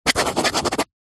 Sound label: pen rollerball sign signature paper pad; office